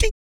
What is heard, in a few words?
VOC PERC, Vocal Planet Jazz FX BASS PERC